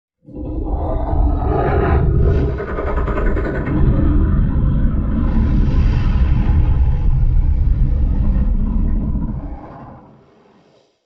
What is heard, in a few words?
T-Rex dinosaur growl warning